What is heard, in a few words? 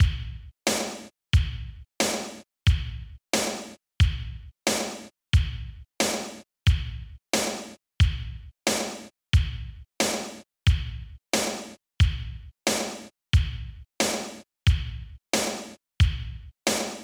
Kick & Snare 8 Bars; vostok inc. switch gamerip 2017